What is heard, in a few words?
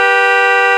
Horn, Kart; AOS RF1 Kart Demo